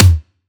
Tom Low; Antidote Advent; Infected Track Drums

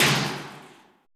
METALURGY, Drums; FILM KIT; Drums In Your FACE!

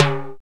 RX BALE, RX-5 DRUMS; Greytsounds VOL-1

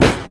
THUMP, FRTLSS, Bass; Sampleheads; Will Lee Bass Library; F JZZ

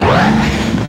BEND UP

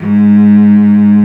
CELLO G#2 L, SOLO STRINGS; Greytsounds VOL-1

